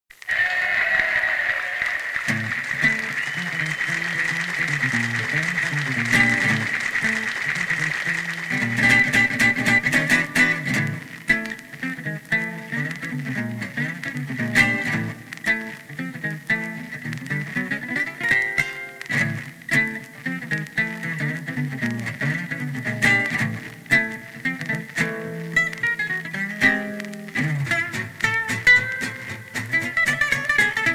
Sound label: Instrumental